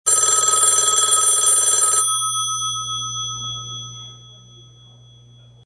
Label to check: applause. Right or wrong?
Wrong